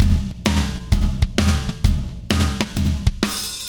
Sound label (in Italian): swing 90 percento